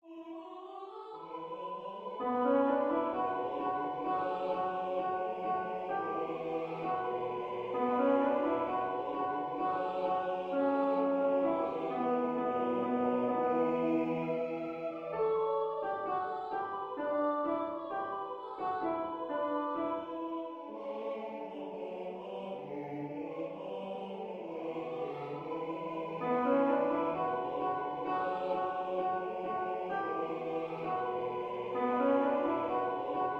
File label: alto tutti